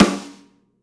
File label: Rock Snare, Roland BK-5; Snares